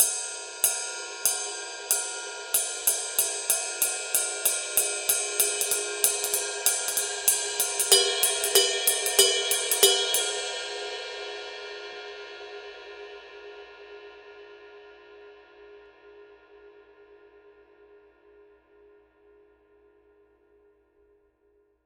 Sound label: power ride pattern; paiste 2002